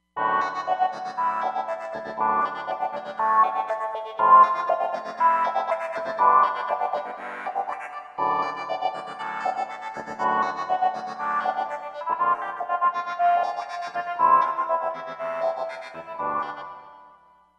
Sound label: Digi-Doo, Roland Fantom XR